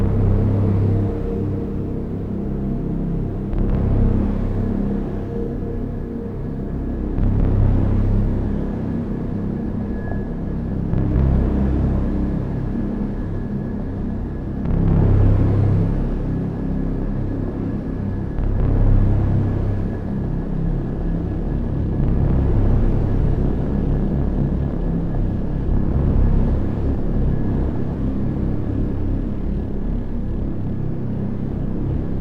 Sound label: Hostage Music Drone; Battlefield 3